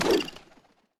tower royal Archers skill strike

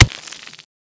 freeze end

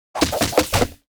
basic Attack; tenshi

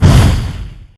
hero dragon fireball explode

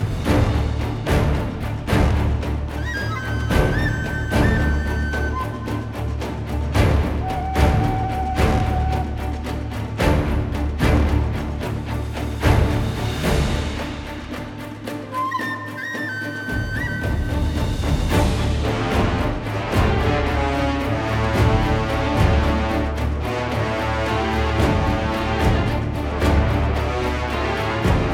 bg music boss

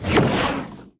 spiders bossfight range

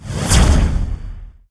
hero alien death explosion

